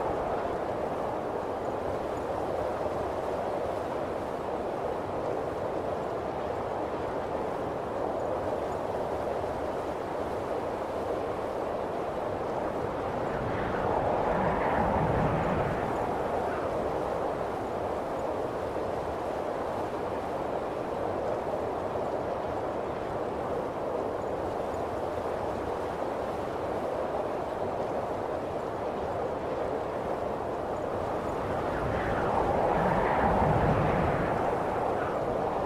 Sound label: snow